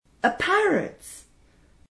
perroquet

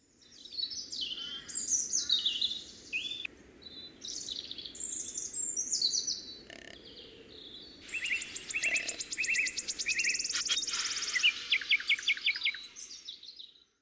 musique

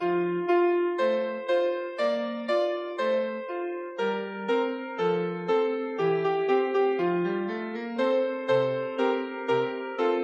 musique

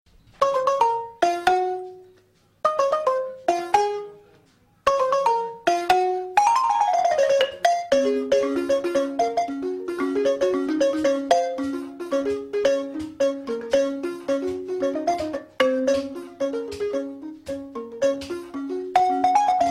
balafon

